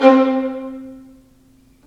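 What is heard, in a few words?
violins marcato, String Sections; Roland LCDP13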